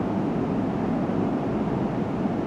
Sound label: Wind Parking Garage; zps; Ambient